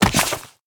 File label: Open Book React